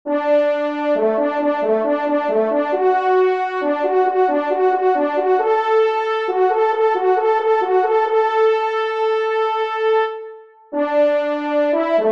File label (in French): TRP; Guillaume Tell; Pupitre 1° Cor; Gustave Rochard